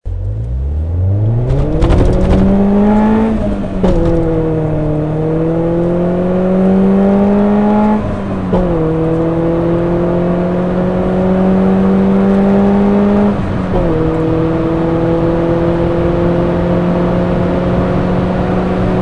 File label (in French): depart arrete micro a l avant